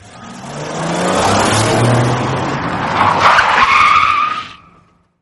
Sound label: drift